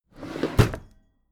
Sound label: packed drawer smooth open heavy